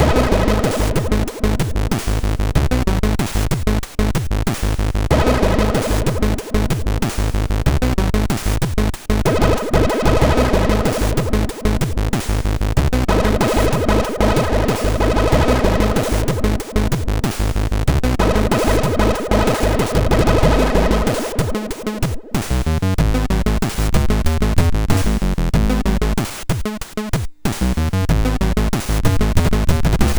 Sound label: Little Beat of SID sid MOS6581; hvsc